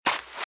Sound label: Footsteps - Sand - Dry - Run - Right; RadwinIsland